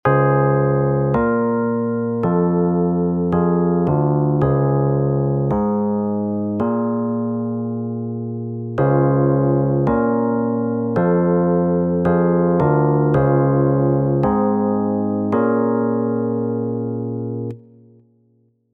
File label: chords comp